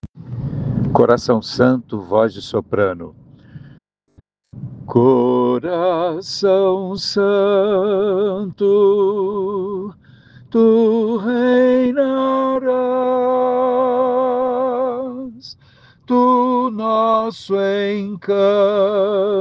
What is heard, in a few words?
soprano; coral sao francisco; missa comemoracao sagrado coracao de jesus